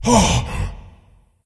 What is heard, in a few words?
surprised; smite; janus